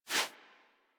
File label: Foley Idle Paw Swipe Quick; Enemies; Kubrow; Warframe